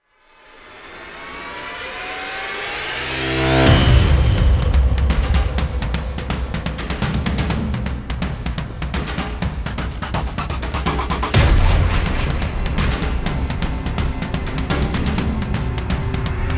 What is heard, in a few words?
Cinematic Trailer